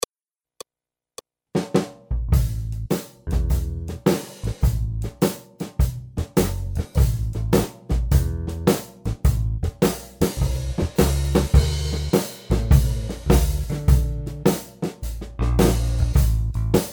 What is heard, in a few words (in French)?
Happy blues Accompagnement seul; Jazz